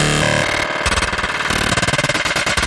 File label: glitch